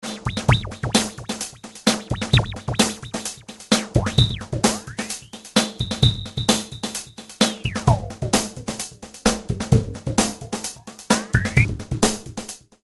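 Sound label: Drumloop LFO Frequency Sweep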